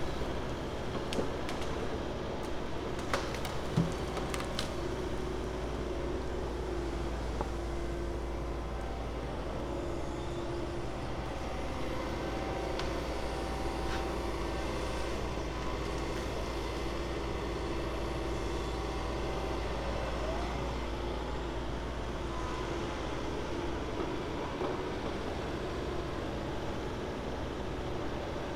forest; fukudai

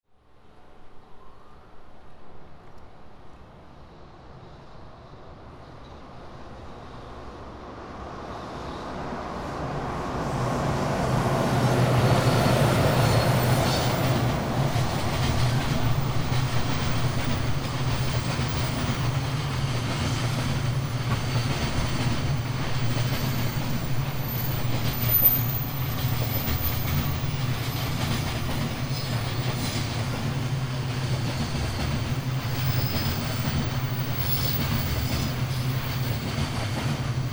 kanayagawa station

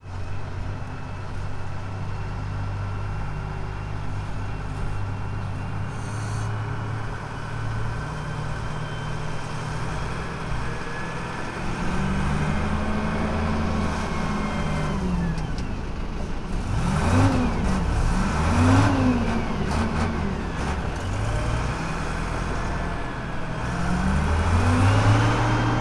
decontamination

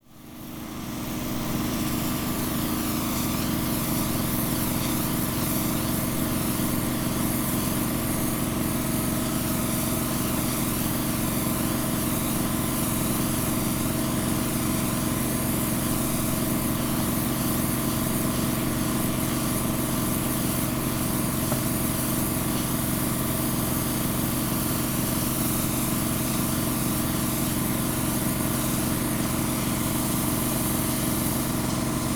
kanayagawa house

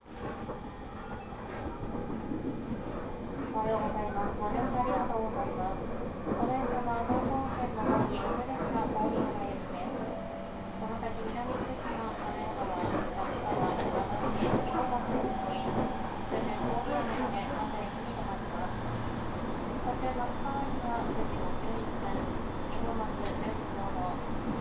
jr tohoku line fukushima minami fukushima

